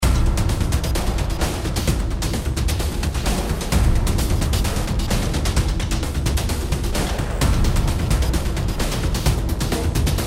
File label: Menu Lobby Option Black Market BGM Loop